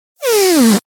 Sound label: Fart Foley Strain, Thin And Windy; Hollywood Edge; sound designer's toolkit v2